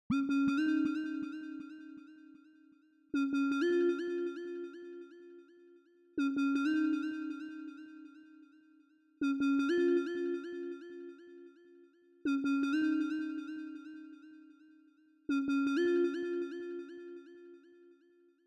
Lead, 158 BPM A# Min; Cymatics; Waveracer

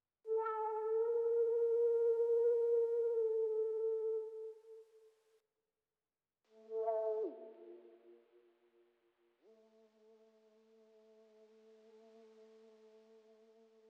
153 BPM D Min Lead; Melody; Reverse; Loop Stems; Cymatics; 2022 Melody Collection - Reverse; Siege

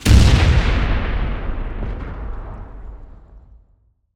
explo grenade; Explosions; PremiumBeat

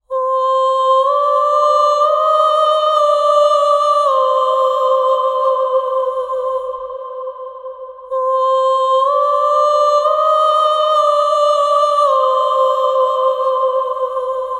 Oh Vocals - Cm 60Bpm (WET); Construction Kits; Ghosthack; SkyRealm; Kit 06 - Before The Storm

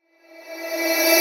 Vocal Reverse E; FX; Cymatics; Light Free Sample Pack; Bonus Samples

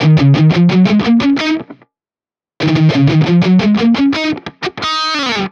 Metal Guitar Loop, 174 BPM D# Maj; Cymatics; Vibrations Guitar Sample Pack

